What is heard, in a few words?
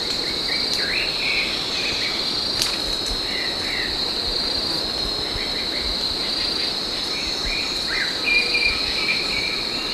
Turdus leucomelas song